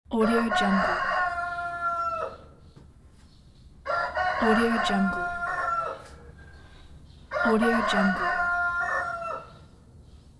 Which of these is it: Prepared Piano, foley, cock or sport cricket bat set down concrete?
cock